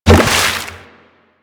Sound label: Aqua Board Land; Warframe; Yareli